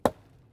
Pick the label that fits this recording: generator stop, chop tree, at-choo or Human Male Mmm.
chop tree